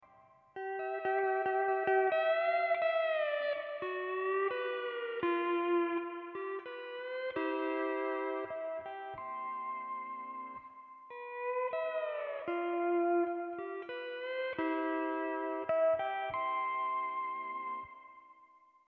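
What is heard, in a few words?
hawaiian technique e9th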